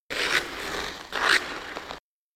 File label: Hockey Player Skate